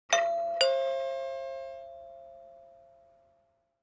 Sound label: doorbell